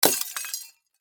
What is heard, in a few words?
Impact Flood Light Bulb Smash Glass; Lights; Household